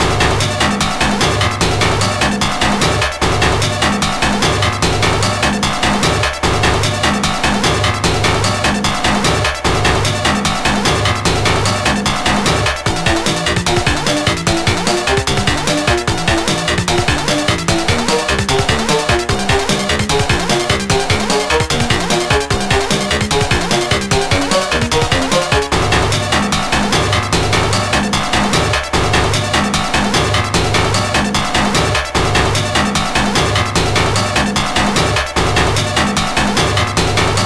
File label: Alert; Catwoman; GBA